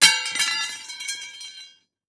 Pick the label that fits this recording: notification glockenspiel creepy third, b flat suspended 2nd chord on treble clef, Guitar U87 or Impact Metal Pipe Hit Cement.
Impact Metal Pipe Hit Cement